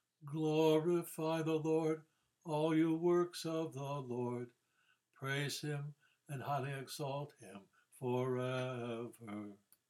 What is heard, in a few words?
Benedicite G; NE Nissouri